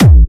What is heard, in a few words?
Bassdrums Hard Kicks; Vengeance Samples